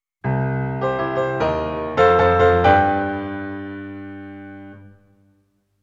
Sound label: Fireworks Fanfare; 1993; NINTENDO